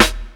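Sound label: snare drum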